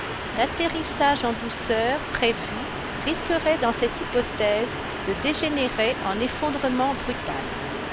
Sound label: subway